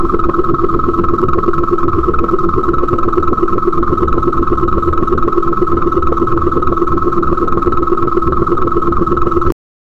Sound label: laser beam steady deep co